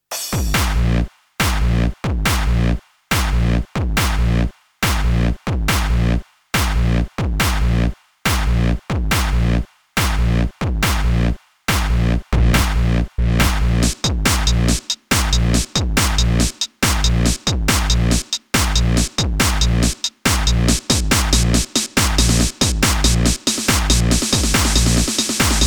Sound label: hardstyle mix